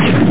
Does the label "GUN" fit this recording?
Yes